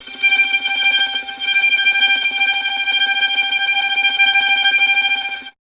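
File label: string violin tremolo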